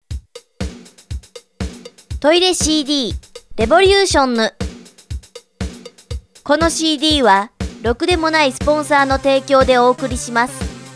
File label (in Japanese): ジングル